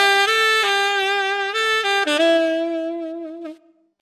jazz sax

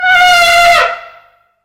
elephant trumpet

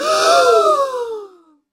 crowd gasp

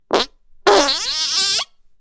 fart squeaky

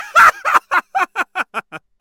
belly laugh

